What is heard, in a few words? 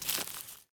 leaves step; Golden Crayon Sounds